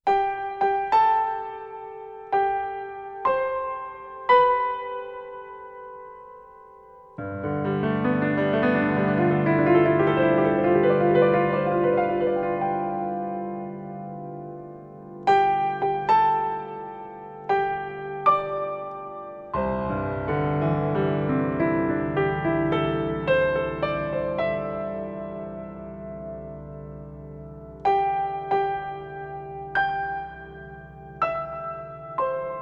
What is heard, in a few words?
music babies